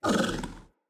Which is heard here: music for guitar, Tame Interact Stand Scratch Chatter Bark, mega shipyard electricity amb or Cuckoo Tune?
Tame Interact Stand Scratch Chatter Bark